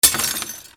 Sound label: BREAKING